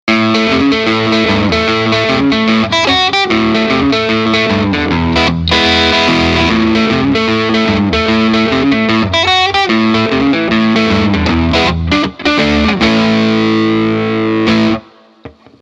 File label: Gretsch G6129 Neck dist